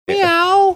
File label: meow